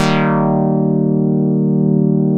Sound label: BOLERO DR, Synth and Organ